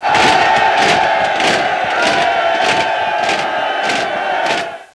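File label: MACHINE GUNS; CIV2; WARHAM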